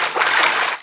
wade, footsteps infected run

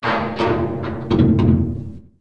docked boat sfx

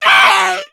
death, voice; hunter

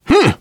positive noise, survivor voice biker

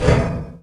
ladder, footsteps infected run

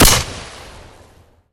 smg silenced fire incendiary; weapons; gunfire

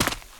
concrete, footsteps walk; survivor

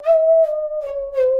saw danger, music, zombat

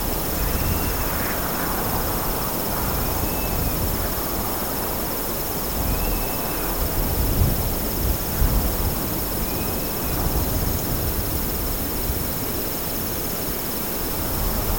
cemetery quiet ambience; Left 4 Dead 2

